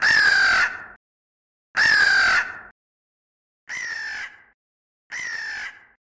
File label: 2 Crows Calling